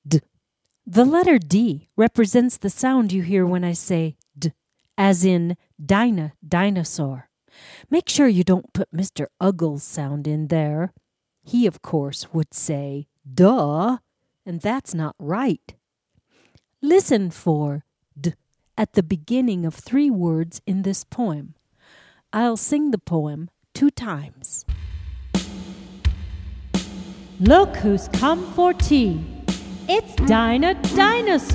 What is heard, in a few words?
Dog Bark (Cuica)